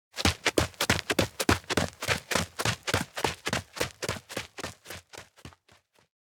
steps outdoor run; chiharu; anemoi

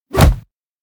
kick person; anemoi

